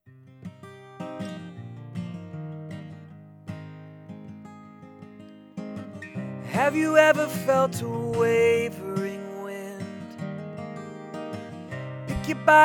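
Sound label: Music, Shure MV5 Instrument; usb mic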